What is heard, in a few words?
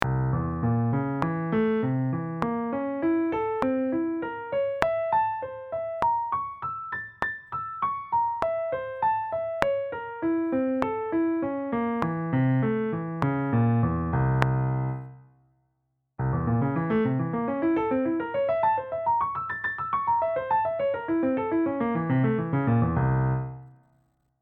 Slow n Fast